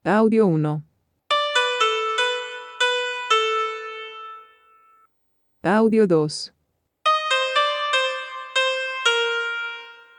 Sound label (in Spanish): tonos